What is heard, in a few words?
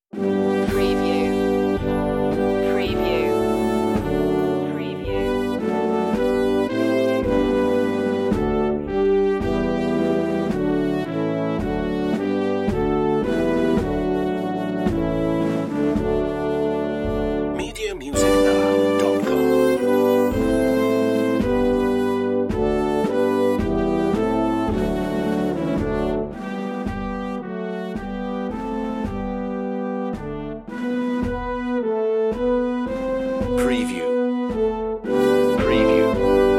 o come all ye faithful brass